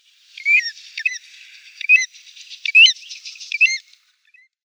Pazifischer Goldregenpfeifer Pluvialis fulva

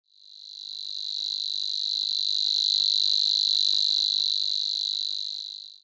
Rohrschwirl Locustella luscinioides

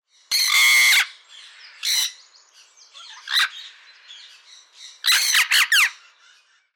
Venezuelaamazone Amazona amazonica; Vogelstimmen

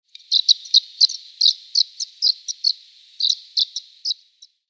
Weissbuerzel-Strandlaeufer Calidris fuscicollis; Vogelstimmen

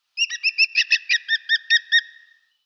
Atlasgruenspecht Picus vaillaantii; Vogelstimmen

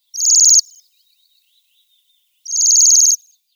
Seidenschwanz Bombycilla garrulus; Vogelstimmen

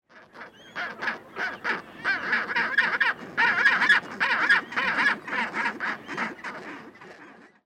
Zuegelpinguin Pygoscelis antarctica; Vogelstimmen

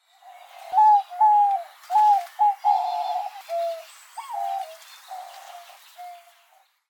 Diamanttaeubchen Geopelia cuneata; Vogelstimmen

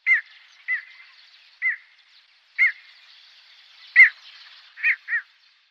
Rallenreiher Ardeola ralloides, Vogelstimmen

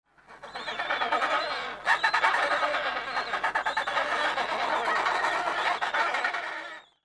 Adeliepinguin Pygoscelis adeliae; Vogelstimmen

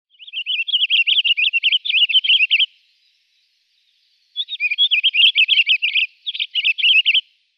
Hakengimpel Pinicola enucleator; Vogelstimmen

